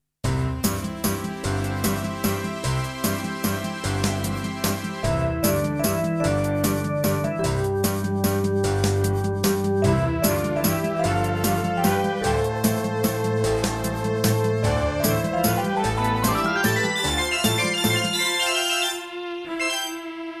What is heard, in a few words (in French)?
valse